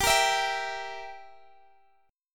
E Diminished chord

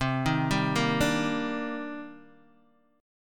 C Minor 9th C Guitar DADGAD

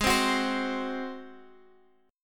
G sharp 7th, Guitar, Half Step Down

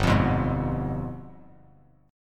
G 7th Flat 9th chord, Bass Standard; strummed; JGuitar

